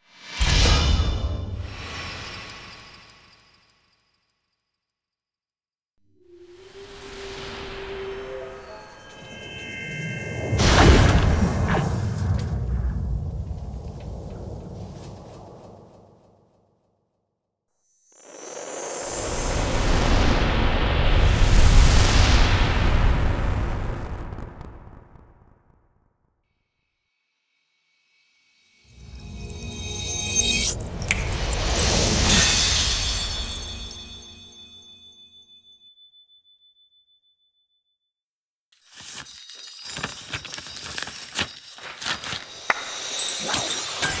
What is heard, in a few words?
Magic Spell Casting